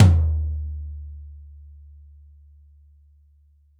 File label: mid tom drum sample e key